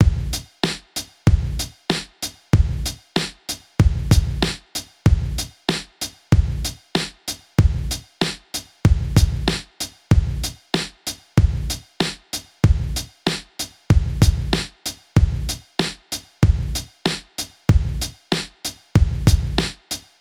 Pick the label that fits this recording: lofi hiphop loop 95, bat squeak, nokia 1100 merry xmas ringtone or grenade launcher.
lofi hiphop loop 95